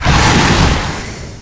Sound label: death